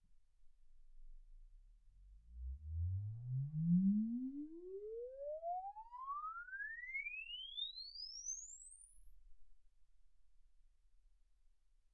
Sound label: exp swept sinus 10Hz 24kHz